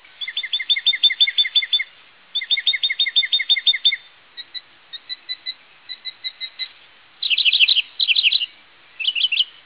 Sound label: mocker, mockingbirds